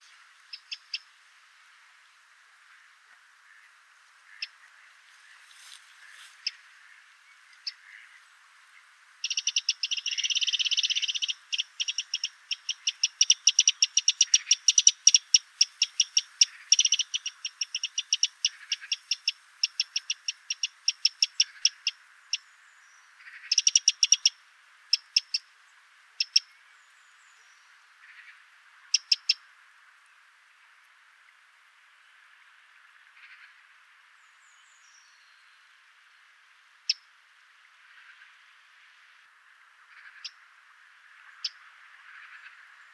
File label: call, wren, Background: Magpie and Mallard.; troglodytes troglodytes